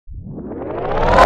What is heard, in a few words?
Reverse Dong, hard techno; hard techno vol1